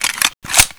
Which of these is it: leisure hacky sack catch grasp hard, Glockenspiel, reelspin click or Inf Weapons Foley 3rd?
Inf Weapons Foley 3rd